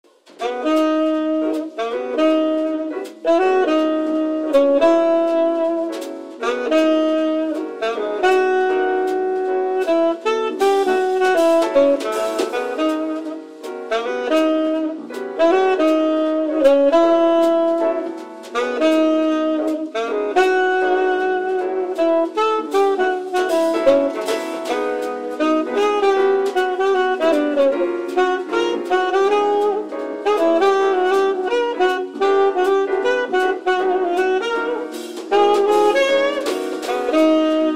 Elevator Muzak; Shift Happens

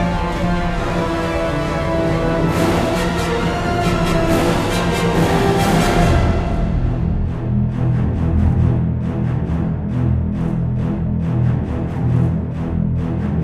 Swarm Success; Mass Effect 2

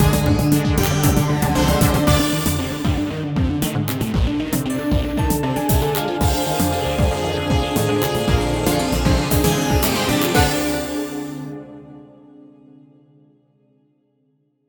Intruder Confirmed; gun point; Crosswire (Unused)

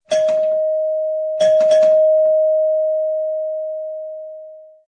Doorbell; Learn to Fly 2; Classic Outro

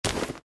Hang Land; Fancy Pants World 1 Remix

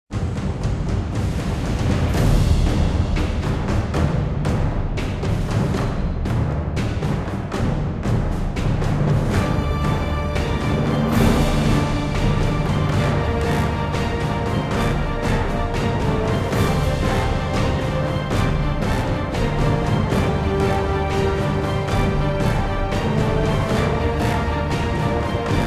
Barrier Success; mass effect 2

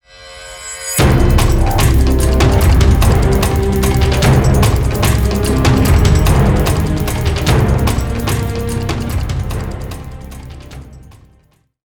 mp time lose; Call of Duty Modern Warfare Remastered

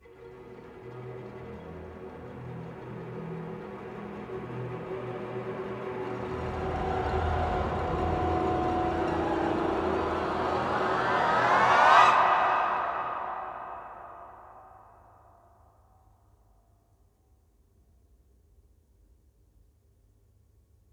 crescendo; blueroom

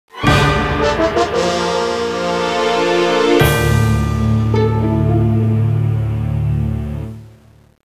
Fanfare - Lose; Yu-Gi-Oh! World Championship 2007; DS

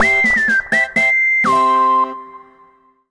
Jingle Minigame End

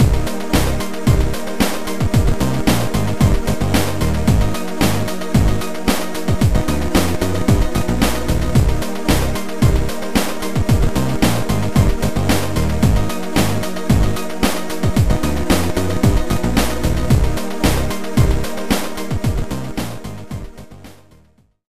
Lose; X-Bladez Inline Skater; GBA

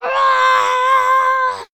Child Scream; don t be afraid the first toy

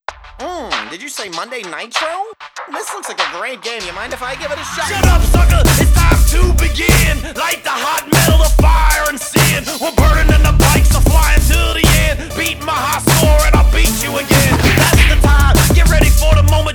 Loader Rap; Trials Evolution Gold

